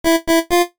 jingles NES; Kenney's Sound Pack; Music Jingles

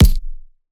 Punching Perc, Straight Glove (Chain); PROCESSED; 99Sounds; Punching Percussion

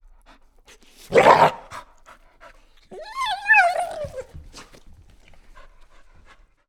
INT Dog Wimper; Coll Anderson

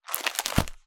PHOTO ALBUM Close; Touchdown Audio; The White Stuff

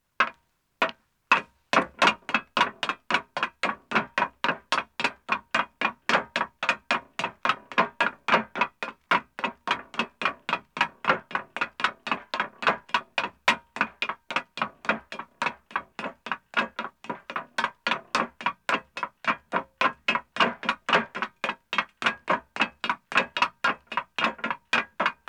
Metal Stairs Descending, 1 Man, Footsteps; BBC Sound Effects Library; Footsteps II

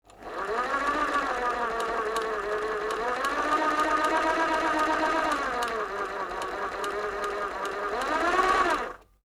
sewing machine

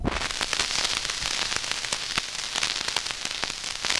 vinyl crackle; 99Sounds; Vinyl Noise SFX

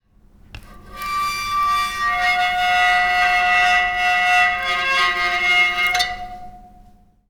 Bowed spoke, bicycle; Submerged Tapes

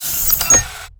DOOR MID, SCI-FI; Foundation Series

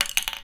Tech, Weird Side Samples

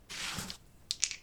die Grab, Casino; Kenney's Sound Pack

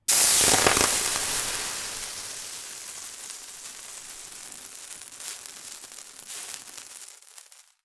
water in frying pan; RedSonic

